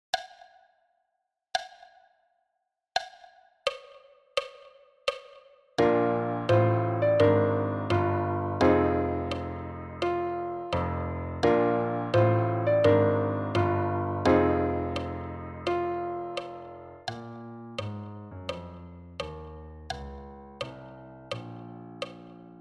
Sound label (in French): piano a 85 bpm